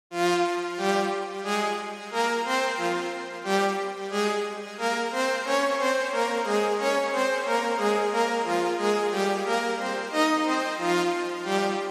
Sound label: Lead Synth Only